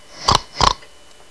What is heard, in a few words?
rhino; They go snort, snort; big animal and has a horn on his head; zoo